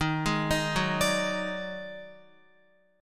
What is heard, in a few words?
Eb Minor Major 7th Double Flat 5th, Guitar; arpeggiated; chord; x,6,6,8,6,9; JGuitar